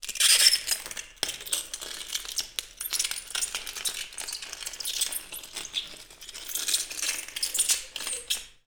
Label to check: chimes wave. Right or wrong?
Wrong